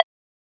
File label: metal detector